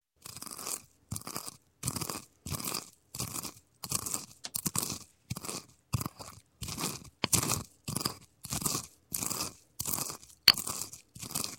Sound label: Mortar And Pestle Grinding Spice; Sound Ideas 2000 Series